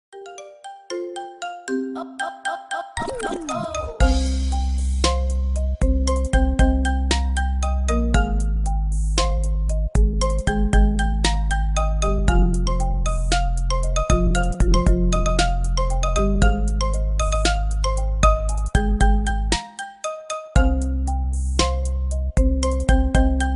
marimba ringtone remix

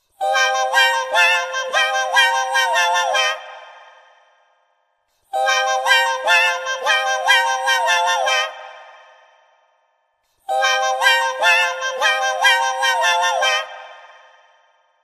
chimpmonks marimba

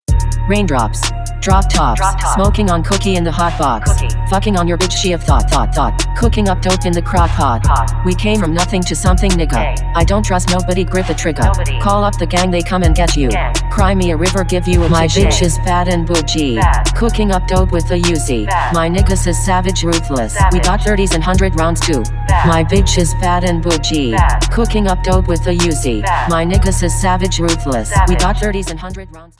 marimba siri ringtone

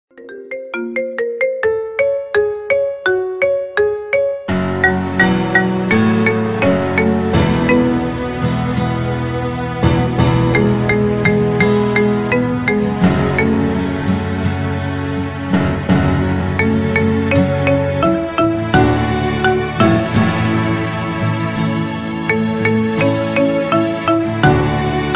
marimba ringtone remix

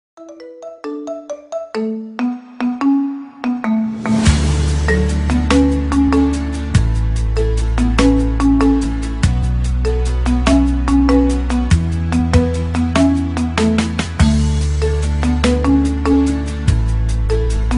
marimba ringtone remix

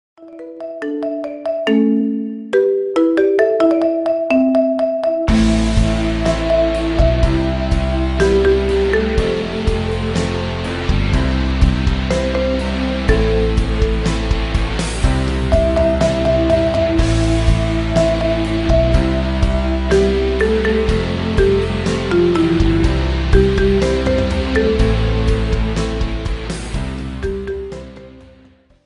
marimba ringtone remix

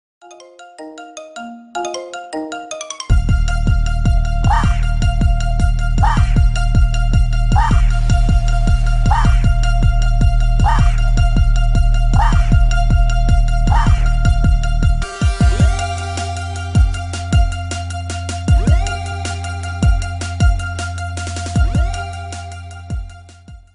bounce marimba ringtone remix

